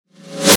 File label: static reverse; Static Reverse Swell Cutoff; Production Element Imaging Element Accent Transition; Blastwave; Static Sound Effects